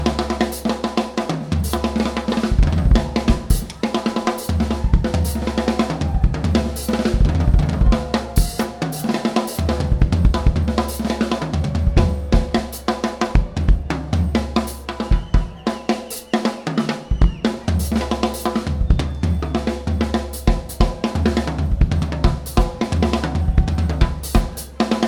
Drum Solo

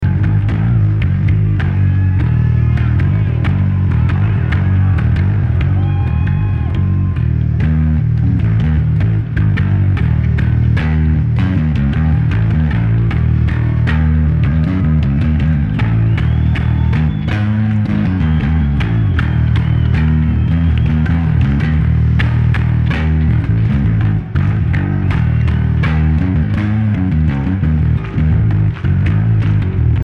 Bass Solo

